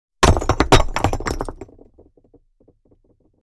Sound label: Wall of small rock bricks fall